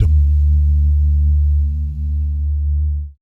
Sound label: BS DUMM, Vocal Planet Jazz & FX; ILIO; BASS DUMMS